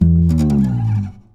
acoustic bass fx; PS-52 WORLD INSTR 2; STRINGED INSTRUMENTS; PS VESTER ACOUSTIC BASS

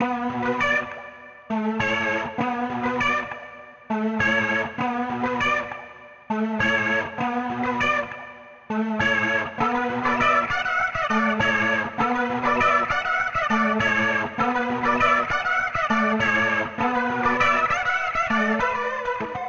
Guitar Loop, alternative; boring; 99Sounds x Monosounds; Instrument Loops; Guitars

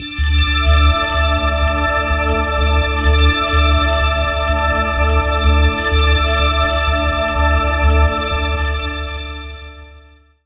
Pad, Melodic Synth; Drum & Bass

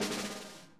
SNARE, Interactive Drum Kits

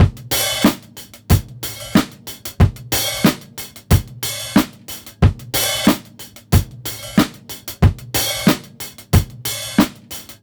BEAT, Roots Funk; USB Soundscan vol.41; AKAI

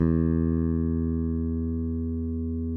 SPEC SUSTAIN, Bass

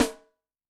Binky, Yamaha DTXpress-II snare

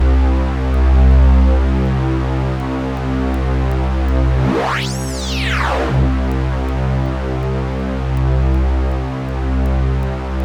C1 FM, Synth; 90 s Sample CDs; NiceBeats; SynthLine VOL-20

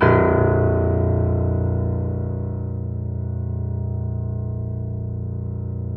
PED FF A, GRAND PED; Best Service Advanced Media Trax Modern Composer

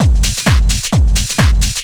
drum 130 techno; Techno Trance Essentials

